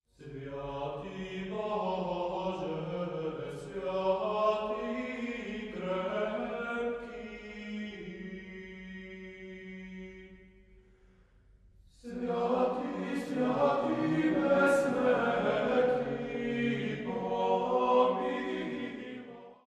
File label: Sviatyi Boje, Boys' Choir; Praise The Lord In Heavens; Святий Боже, П. Динев; Sofia Boys' Choir